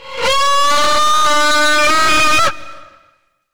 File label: baby die; slender